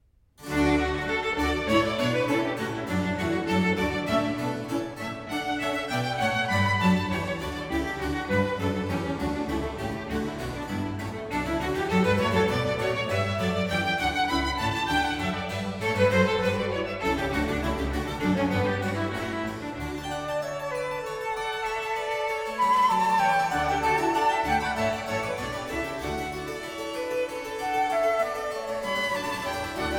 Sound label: one distorsion mos; sim hear amp